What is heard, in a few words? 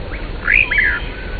zweeoo, Empidonax traillii; Passeriformes; Tyrannidae